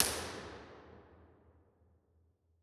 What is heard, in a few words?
Bigger Spaces Silo Cap, Impulse Responses; Ableton Live 12 Lite